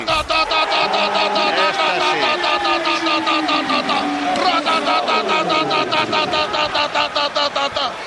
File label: rattatatata